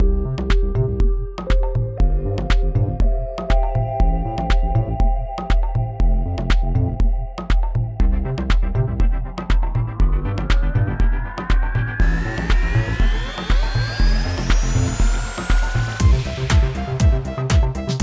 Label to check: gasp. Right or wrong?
Wrong